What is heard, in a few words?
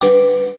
bonus chance; Book Of Fortune